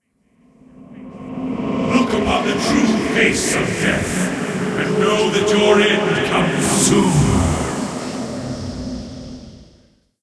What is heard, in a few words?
warning